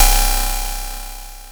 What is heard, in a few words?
perc, opl3; M8